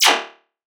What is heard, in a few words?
fx laser noise blaster D#; Virtual Riot